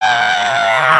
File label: failed build; emotes